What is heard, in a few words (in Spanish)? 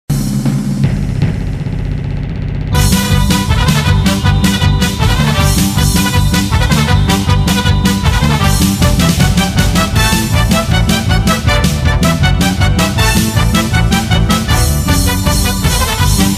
EFECTO DE SONIDO FANFARRIAS; Andrés Rivadeneira